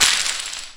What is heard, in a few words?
erase; tromi